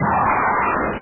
zvuk poleta reaktivnogo snaryada

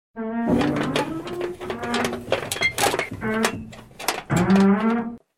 skrip metallicheskoj dveri i zvuk perebirayushih predmetov

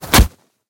korotkij bistrij slabij udar

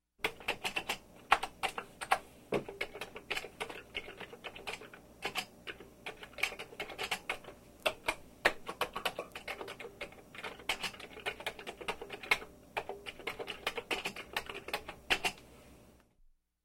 zvuk klaviaturi kompyutera

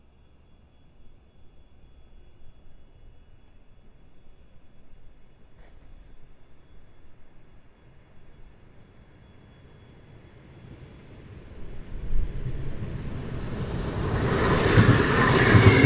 elektricheskij motorvagonnij poezd

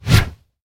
shurshashij korotkij zvuk chirka bejsbolnoj bitoj po asfaltu

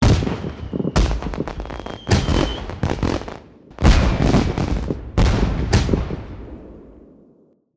prazdnichnij salyut ili boevoj fejerverk pobedi

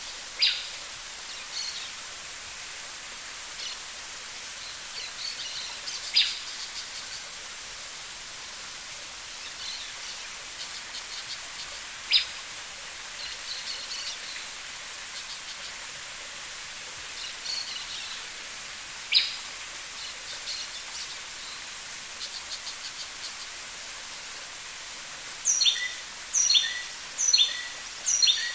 shelest listev i redkoe shebetanie ptic

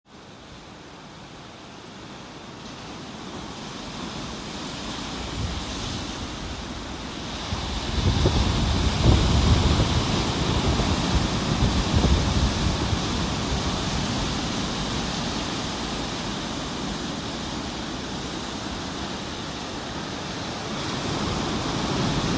volni okeana

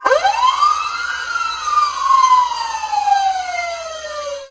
protyazhnij zvuk policejskoj sireni

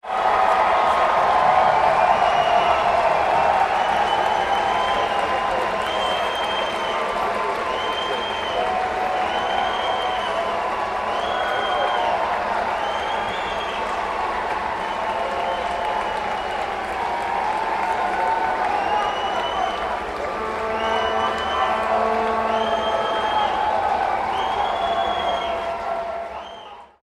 aplodismenti smeshannie so svistom

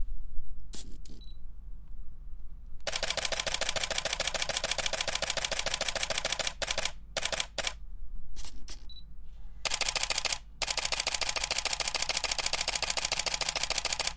zvuk fokusirovki i visokoskorostnoj serijnoj semki

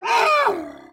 rev monstra zhalobnij s richaniem

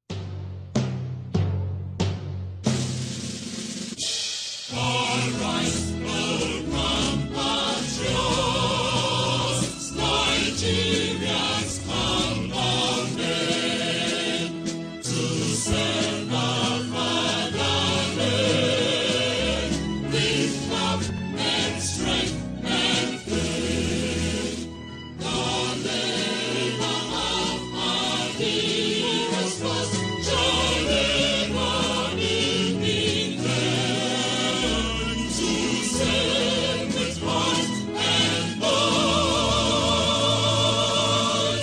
gimn nigerii

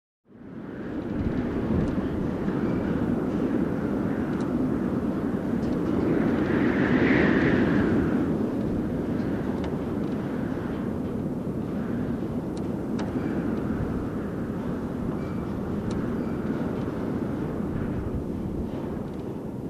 veter v ambare

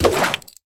kovbojskij vzmah lasso

